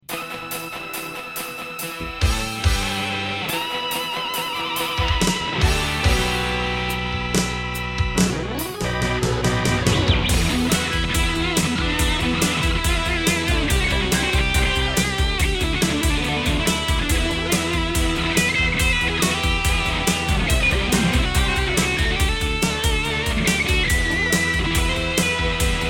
VOX Satchurator hard rock

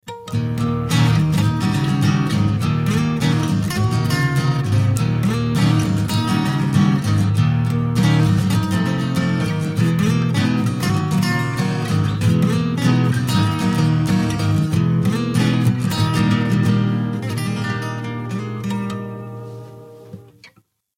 Dowina DCE-111 sound studio

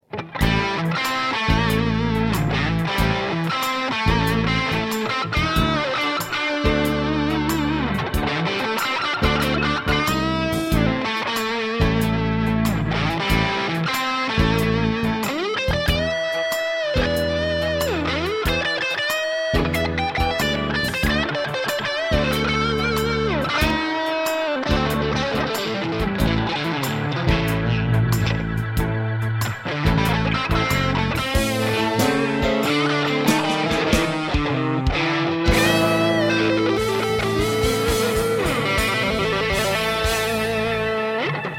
VYPYR30 and SANPERA2 song; PATCH A-2

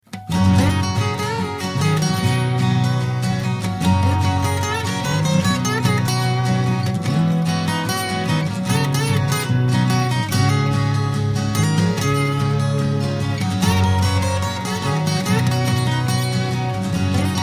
Dowina DC-333LE sound studio

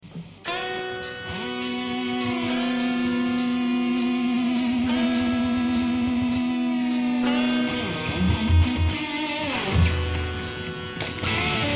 VOX Satchurator hard rock

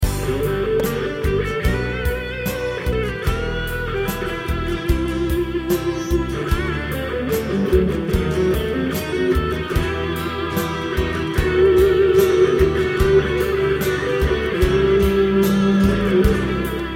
sladak electric; Dowina J-999S

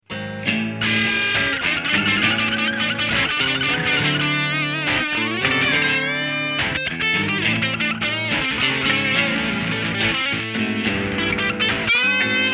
GERHAT SuperMetal blues song

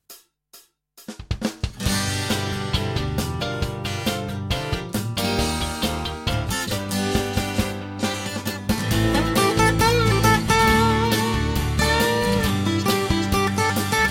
MARRIS D-220M

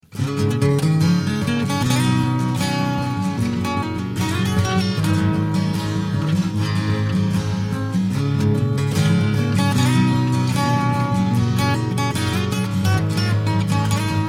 Dowina D-333LE sound studio

